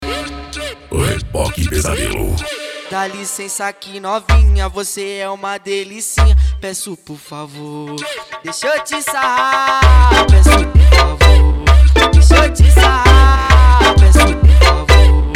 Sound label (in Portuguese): sound car